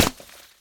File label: stone, hit; tacz-tweak-pack; taczmechanics